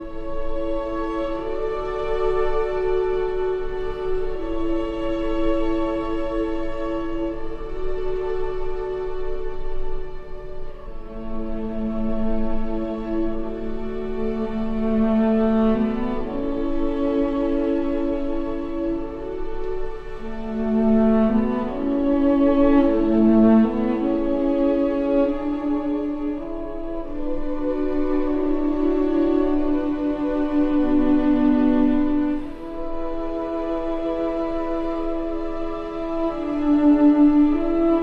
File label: for cello and strings; Archi di Santa Cecilia